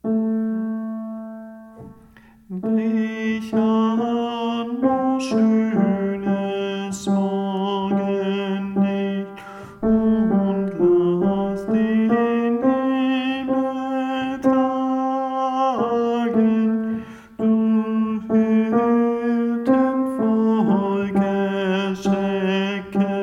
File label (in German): Brich an Tenor